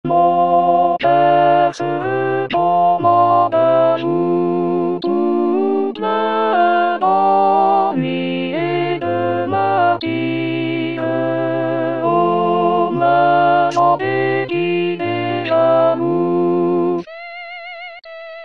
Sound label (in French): mon coeur alto